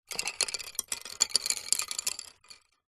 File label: office pencils handful set down concrete